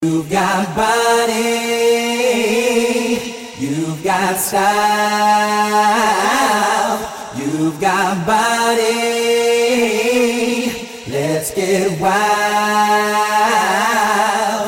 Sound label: vocal